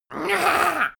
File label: imp laugh